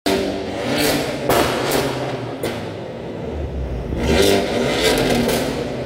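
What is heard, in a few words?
Hyundai Elantra, exhaust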